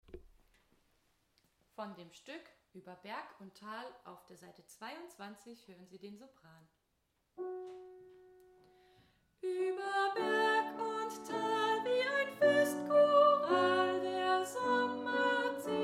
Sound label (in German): Sopran; Ueber Berg